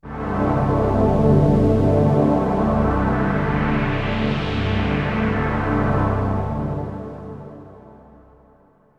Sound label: SYNTH PAD AMBNT 160 C, Ambient; eJay; Sphere